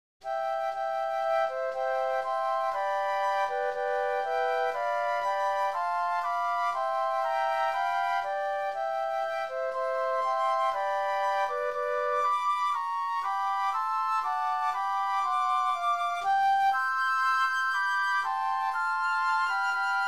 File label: Hymn 2 Flutes